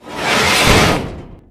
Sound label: rot down; Guincho